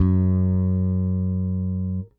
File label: Whole Notes F#, Bass; Bass X